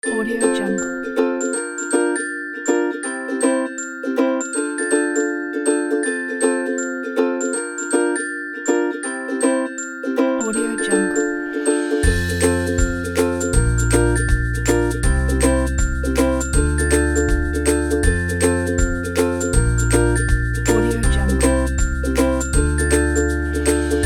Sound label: cheerful upbeat and happy